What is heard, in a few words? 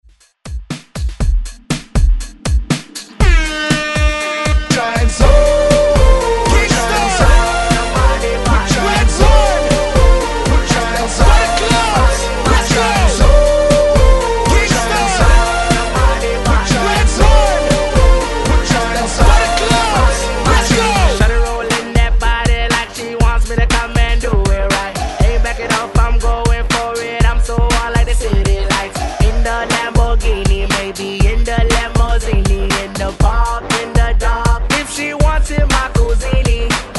Hype Re Drum 120Bpm; DJDOLLS